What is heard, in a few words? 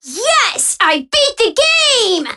Alarm Clock